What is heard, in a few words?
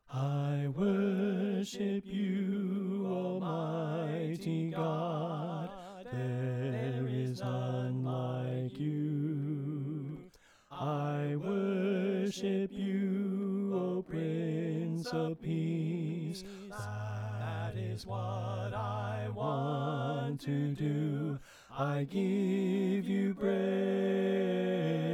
Bass Left